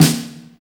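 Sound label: snare military; Roland L-CDX-01; Snares 6; Sn Modules 6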